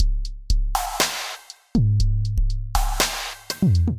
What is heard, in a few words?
Minimum Kit, Drums, Synthesized; Ableton; Factory Packs; Drum Essentials